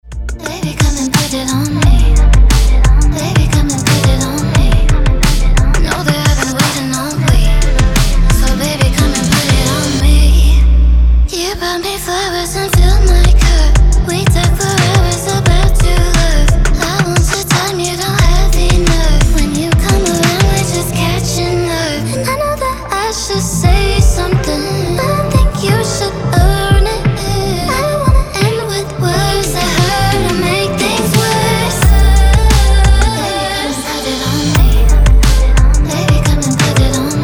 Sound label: POP